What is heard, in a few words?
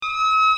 A dis3 ff